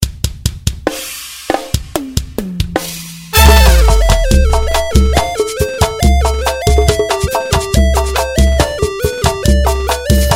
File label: groove samplers